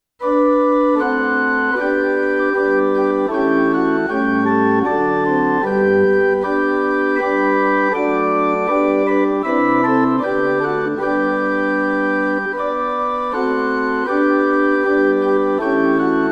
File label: Once In Royal Davids City Backing